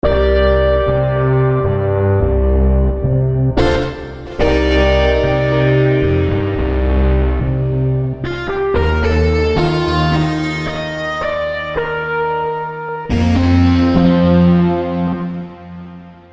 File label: PolyKB Guitar; ChorX